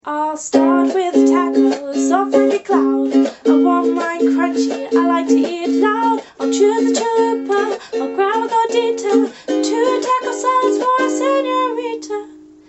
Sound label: fast food folk song